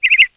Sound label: tram; NFS High Stakes